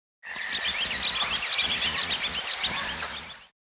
Violet-Fronted Brilliant (Heliodoxa Leadbeateri), Andean Birds; S. America